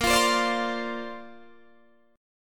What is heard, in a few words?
Bb Major Add 9th, Bb, down, Guitar, Open D